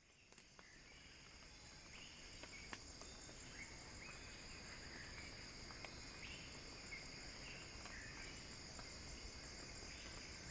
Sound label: Cicada Crescendo; Axel Drioli; Sounding Wild; Wind Is the Original Radio